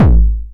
909 BD Tape Sat

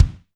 Kick, Alesis DM5

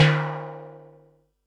tom, Pearl SC-40 Toms

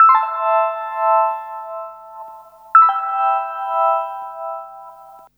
FK KEY, FUNK; USB Soundscan vol.47; AKAI; Hip-Hop & R&B

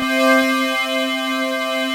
TUBE ATK, TECHNO; Pro-Rec - Synthsonic; Z1 TECHNO

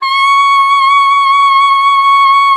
C#6, alto sax; Xsample VOL-2

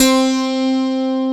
PIA Old Smpl; Best Service Gigapack 1

